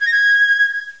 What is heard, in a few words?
pipe